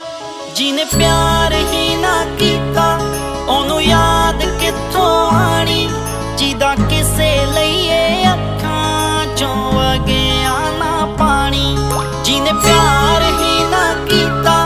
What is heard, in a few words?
sad punjabi song ringtones